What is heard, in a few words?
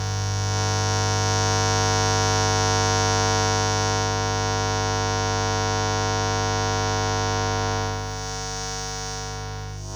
Electromagnetic Fields